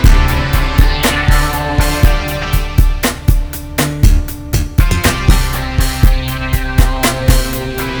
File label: TECHNO